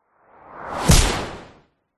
sound design whoosh fast loud with a hard smack impact hit; zapsplat